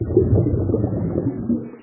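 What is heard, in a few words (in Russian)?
звук подводного мира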